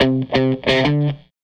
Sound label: Les Guitar Loop